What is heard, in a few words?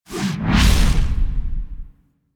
Throwable Coolant Item Whoosh; Ambience; Warframe; Venus Open World